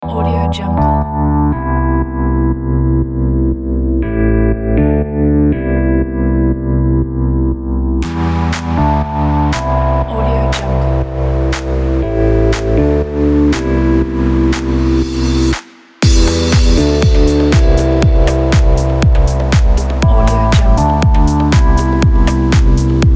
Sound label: deep fashion